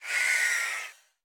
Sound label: Death